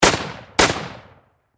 Rifle M1 Garand Semi Automatic Double Shot Close Perspective; Warfare